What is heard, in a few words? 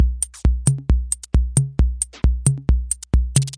134 bpm plippy techno